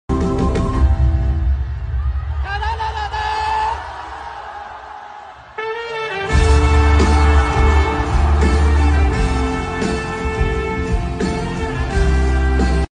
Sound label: trumpet; ترومپت معروف اینستاگرام